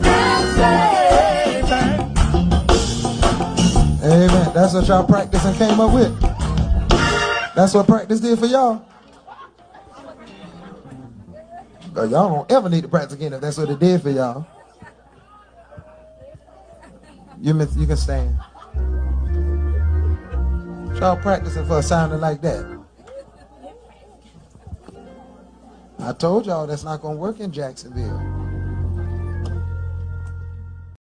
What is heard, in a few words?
church practice